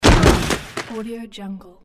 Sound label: body fall